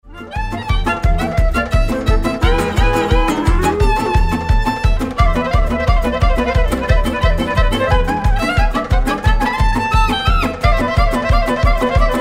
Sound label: Medley Swing Klezmer Orchestra